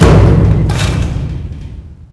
lift stop; Max Payne

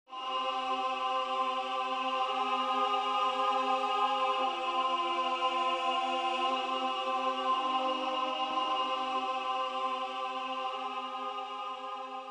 choir; Myst III Exile

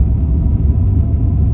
cairn weld, ambience

